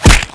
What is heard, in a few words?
hit; acolyte